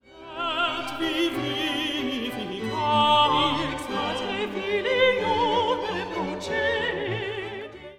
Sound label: Skipping motif